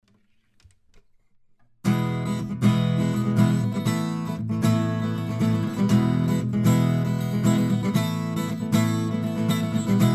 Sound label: AKG C414 EB Kugel